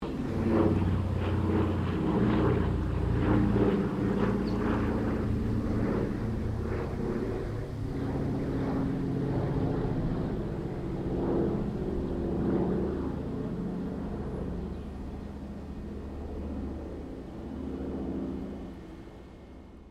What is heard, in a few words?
AIRCRAFT DOUGLAS DC3 PASS; 1945 DC commercial aircraft; Airplane; Airplanes; Vehicles